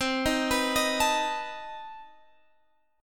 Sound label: C Minor Major 13th C down Guitar Open G